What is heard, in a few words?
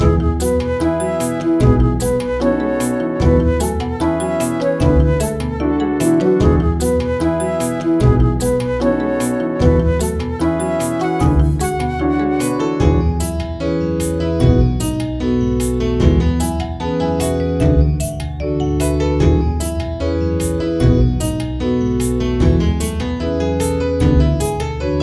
Hub Music; Rhodes Hub Music; Hellenica